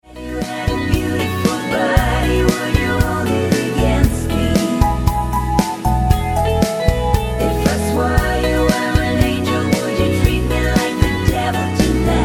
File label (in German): mit Chor